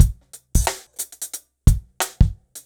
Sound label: 090 HipHop Live Drums; Digidesign; Pro Tools; Pro Tools Essential Loops